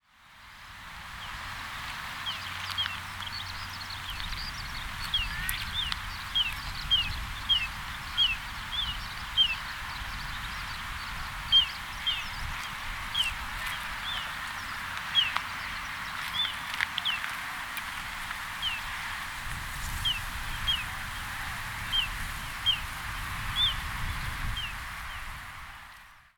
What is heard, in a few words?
kotidori, bird song